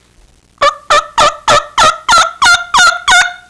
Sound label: plead cluck, fall turkey; fall turkey 99